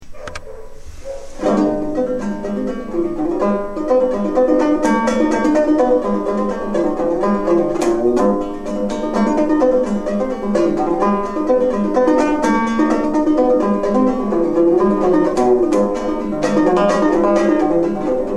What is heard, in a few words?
Snake River Reel